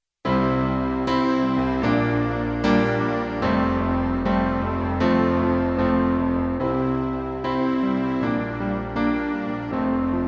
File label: Pop Rock